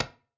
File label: SFX Button; mobile